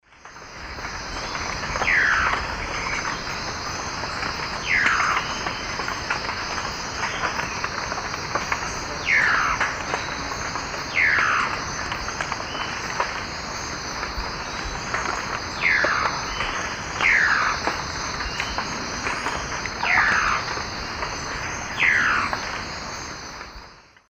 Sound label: Gymnopithys leucaspis, calls; S. America; Eastern Lowlands